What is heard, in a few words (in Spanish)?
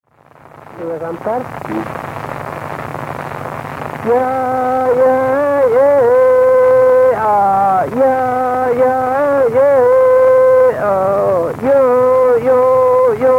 Canto de mujer, Mapuche; Música Mapuche